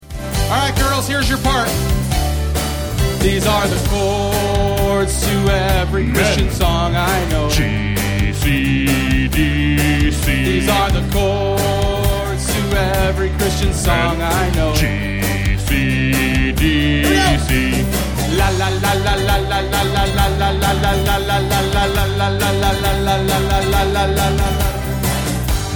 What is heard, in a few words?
AN chords